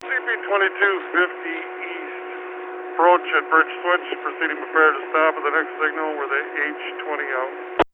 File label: Bridge Switch